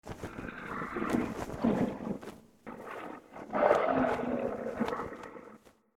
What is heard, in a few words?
demon idle, monster